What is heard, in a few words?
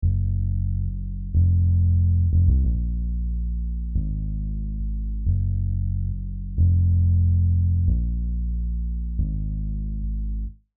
Bass